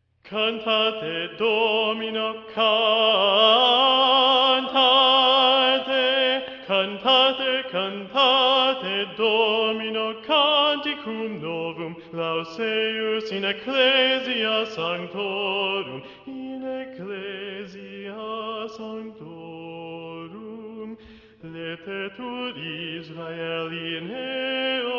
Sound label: Cantate Domino Bass